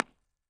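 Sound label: Port Townsend Skatepark, Impulse Response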